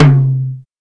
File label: TOM, Jungle; Gorge Sample Pack Vol.1; San Gabriel